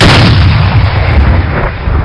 kar shoot

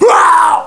human death

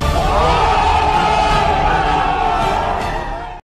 ooo

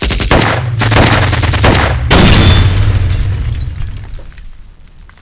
gang fight

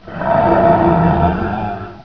pain; floater

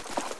satchel radio fidget

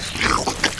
spore launcher reload, weapons

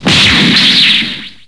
strong kick

